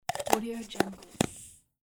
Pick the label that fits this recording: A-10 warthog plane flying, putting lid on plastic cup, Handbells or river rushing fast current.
putting lid on plastic cup